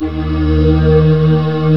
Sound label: FAIRLITE G2; Northstar - New Gold VOL-1; M1 FAIRLITE